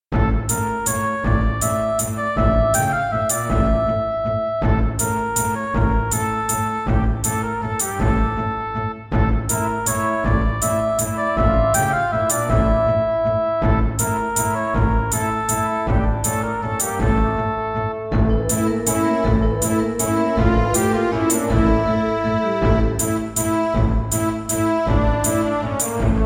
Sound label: Yamaha MU500 GSMODE